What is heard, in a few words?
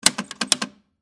Telephone Check, Technology, Telephone, Check, Hook, Switch, For, Dial, Tone, Pay, Phone; Multiple, Times; BlastWave